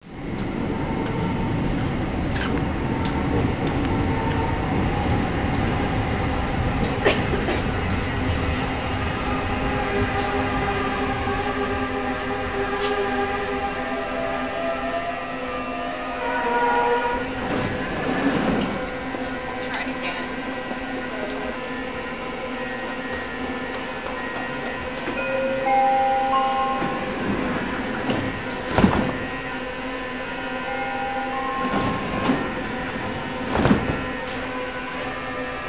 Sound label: Vancouver Beep or musical notes C#, G#, C# (upward); skytrain; metro